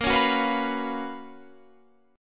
Gb Major 11th

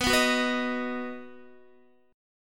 B-Major B down Guitar; strummed; JGuitar

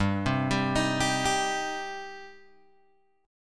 G Suspended 4th Sharp 5th G, down, Guitar, Open G

